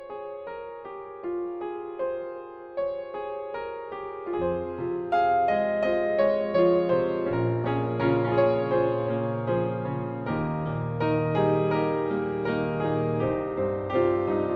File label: piano